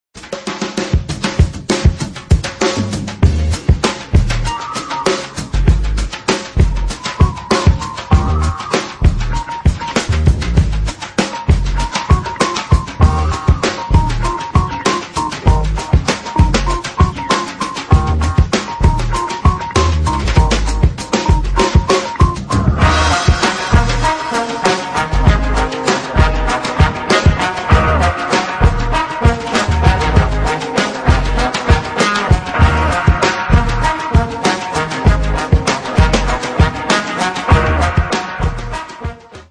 trombone

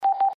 Simple Beep Beep

